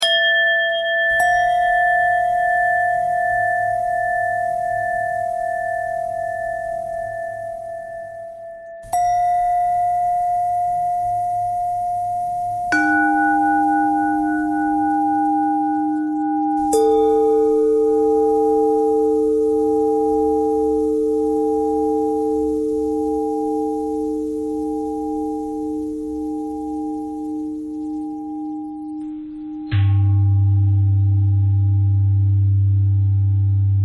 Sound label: bell thread bend thread